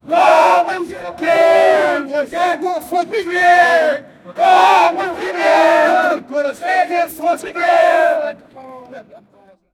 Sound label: pirate men rally in the